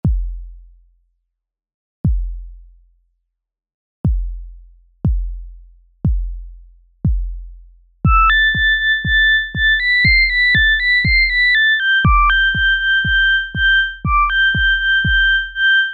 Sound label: Paddy On The Hand Car 60bpm half